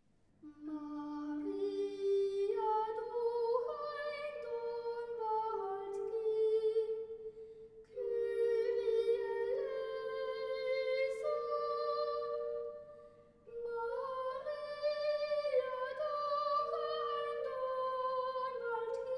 Maria durch ein Dornwald ging (Arr. for Solo Voice & Organ), Boys Choir; Sacred Choral Music